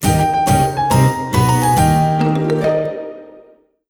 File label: Rank Up! Fanfare; Research